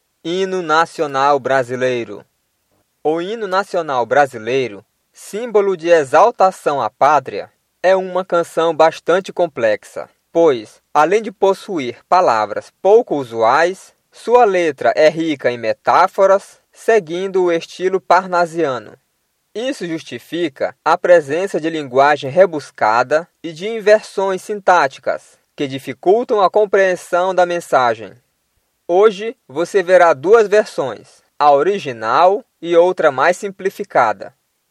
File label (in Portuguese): hino nacional